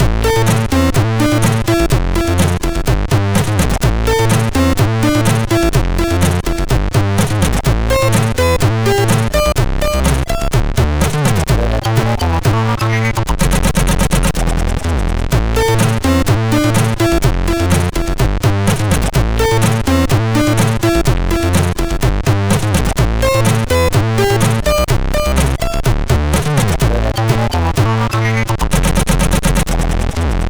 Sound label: Good Bass, MOS6581R2; hvsc